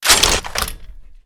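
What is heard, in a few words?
machine gun open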